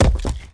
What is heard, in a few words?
masonry hit